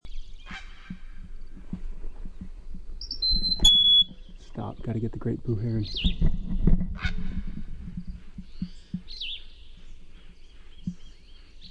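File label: Great Blue Heron call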